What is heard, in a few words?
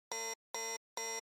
warning